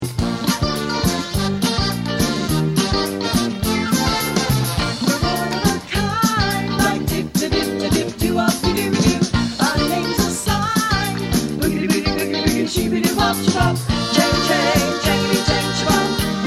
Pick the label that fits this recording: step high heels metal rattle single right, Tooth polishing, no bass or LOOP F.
no bass